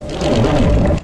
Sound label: cry